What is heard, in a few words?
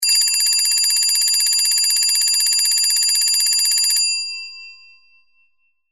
tinny Alarm Clock Bell